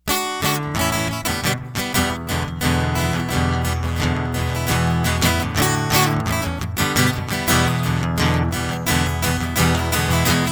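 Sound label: Roiling Guitar Loop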